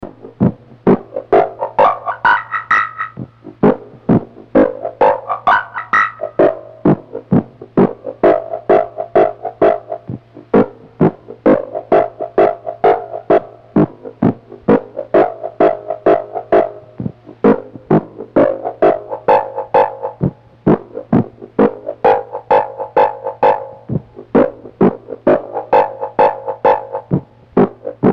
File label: Buchla Box Music Frogs; Warners